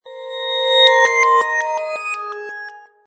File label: Portal